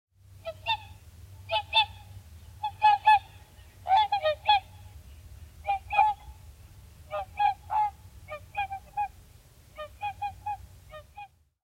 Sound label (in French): cygne chanteur, chant